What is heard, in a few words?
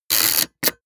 metal ratchet spin fast; industrie wirtschaft; metall